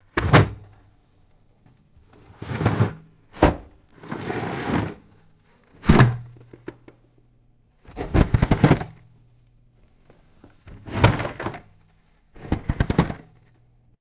plastic ice chest cooler moving and scraping; foley

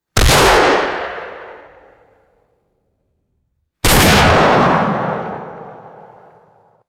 booming crunchy shotgun blasts; guns

